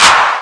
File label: electro clap; Electro Kit; STYLE KITS (Hip Hop, Etc); Boy In A Band Electro Kit